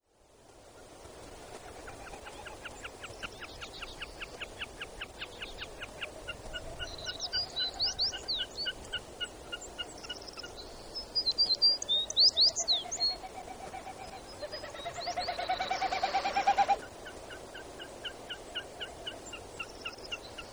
Avian chorus with Wilsons Snipe American Tree Sparrow and Savannah Sparrow, Alaska